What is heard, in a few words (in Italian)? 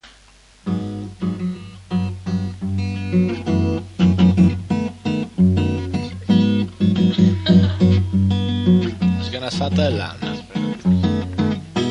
samba improvvisata; GITA A BERGAMO: commenti live